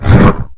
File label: sleep jump; sleeper